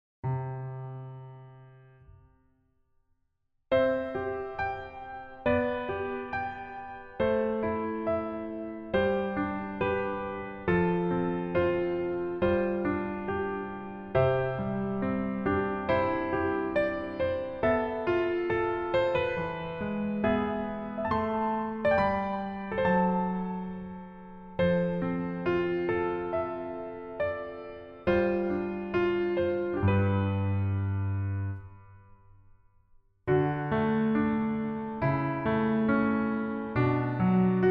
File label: Piano